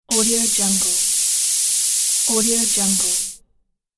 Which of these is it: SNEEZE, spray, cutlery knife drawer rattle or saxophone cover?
spray